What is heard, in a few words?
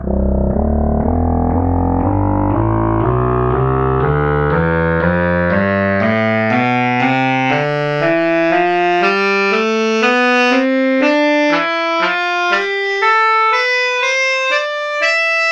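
tenor saxophone tonleiter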